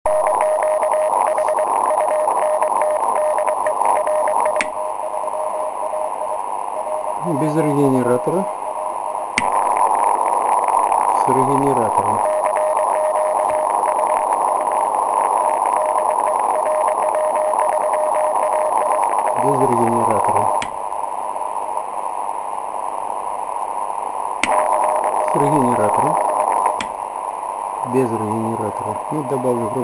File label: Hunt REGENERATOR